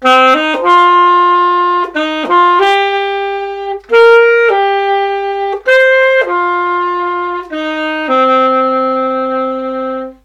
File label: sax